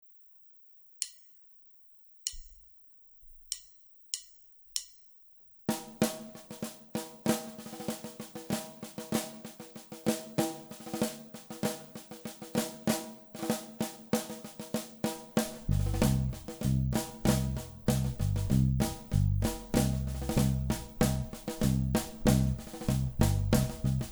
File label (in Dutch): Met trommel en trompet